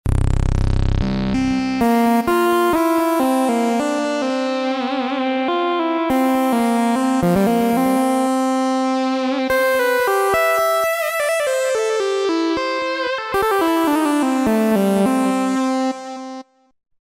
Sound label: Source Lead, Roland V-Synth; Factory Patches